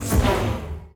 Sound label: ftl warp progress ship; ambient; Stellaris